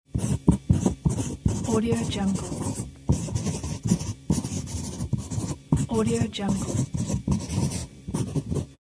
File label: fast writing